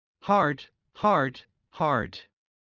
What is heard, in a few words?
Heart low; Classroom humanbody